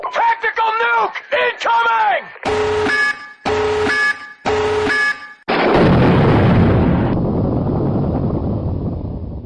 tactical nuke incoming

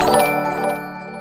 follower alert sound; twitch

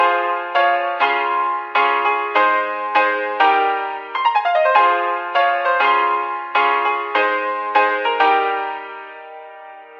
glitch hop; invincible

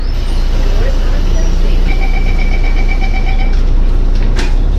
door closing; smrt

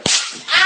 slap ahh

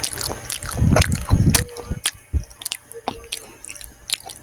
eating sound

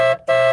car honk; chiikawa

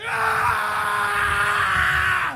officer scream; guts and blackpowder

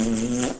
fart strain

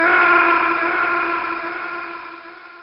snake death scream

